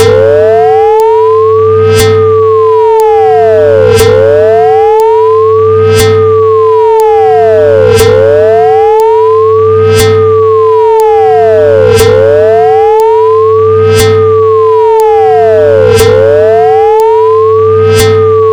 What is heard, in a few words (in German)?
Klingelton